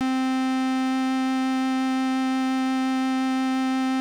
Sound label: Beep Long